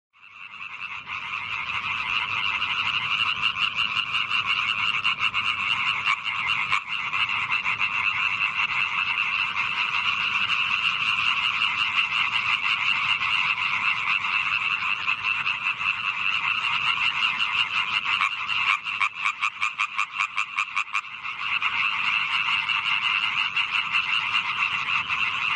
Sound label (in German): laubfrosch